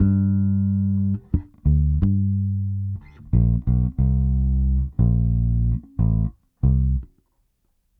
Bass, 70s Analog Funk; Sonic Foundry (Sony Creative Software); What it Is!

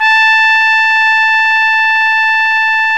TRUMPET; Best Service Gigapack 1

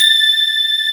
digi decay

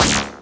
BANG, Electro Perc; E-MU Formula 4000 Series Vol. 3; Analog Odyssey

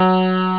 esq piano hh; Amiga Soundtracker Sample Packs

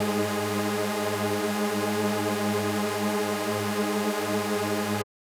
SuperSaw G#4; KG MegaSaur; M8

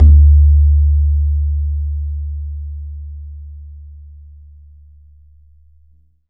BAYAN; Q Up Arts; Denny Jaeger Private Collection

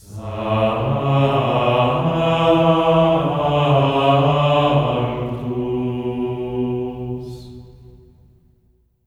SANCTU A3; Spectrasonics; Symphony of Voices; GR SANCTU

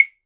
clave; E-MU Producer Series Vol. 7 – Old World Instruments

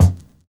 VINYL BD, Drums Dance & Groove; USB Soundscan vol.11; AKAI; VINYL KIT

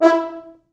Fr Horns stac

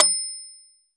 Metronome Bell; Roland BK-5

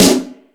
All Snares, E-MU Pro-Cussion

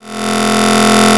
Buzz Fill, Drums & Percussion; Sonic Foundry (Sony Creative Software); Futurist Drum & Bass

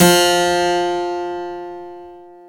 KEY HRPCR, Roland; Roland - LA Composer vol. 1

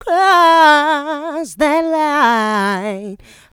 mixed vocals; Houseworx

